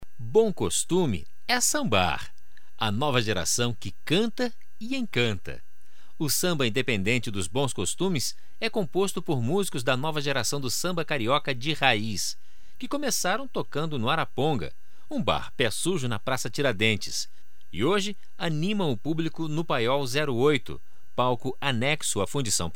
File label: samba; SAMBA INDEPENDENTE DOS BONS COSTUMES